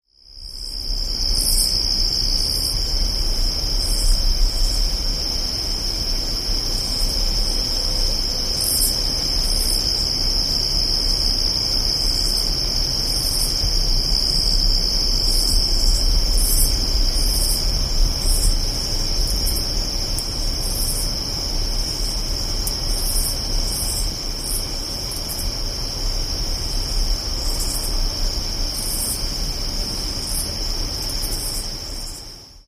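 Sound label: Crickets Night Insect Ambience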